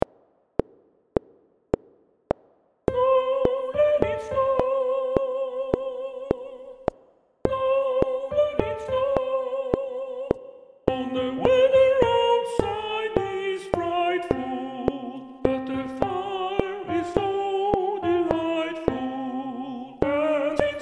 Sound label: SOPRAN CLICK